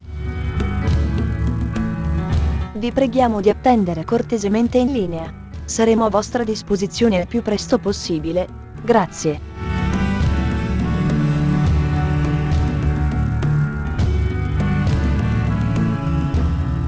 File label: Ambient Ita